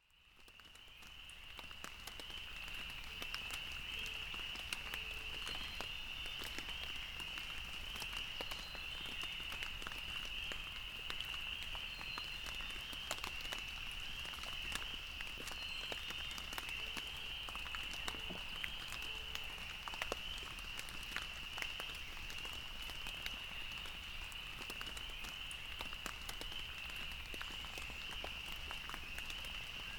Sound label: forest drizzle